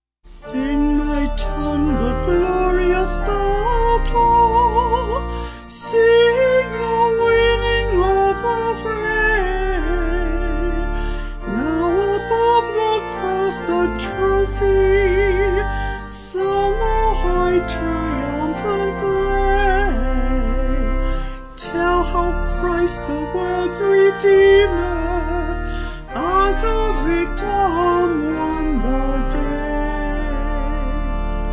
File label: Sing My Tongue, Pange Lingua, Vocal Organ